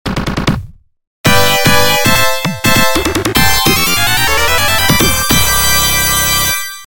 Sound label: stage clear